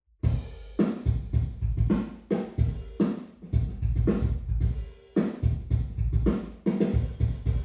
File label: drums, binaural; ground Truth Pos7; em32 Aalto